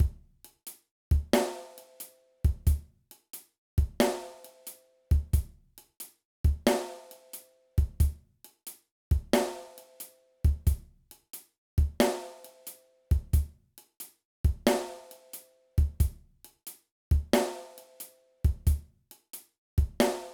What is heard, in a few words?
Intermediate Drum Beats